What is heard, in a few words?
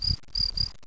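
swamp, ambient; Quake; PainKeep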